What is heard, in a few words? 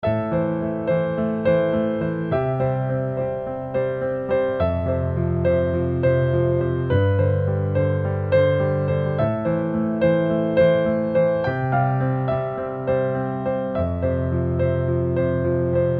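Midnight Keys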